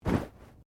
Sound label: Body movement Slide; Body movement foley to simulate falls and deaths; Body Sound Effects; Bodies (Sound Effects:Human)